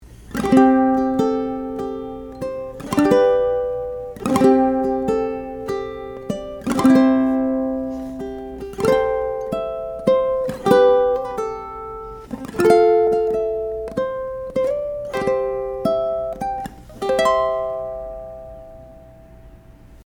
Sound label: Happy bday Uke